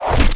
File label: MOB BATTLE ARROW, Battle Mob Weapon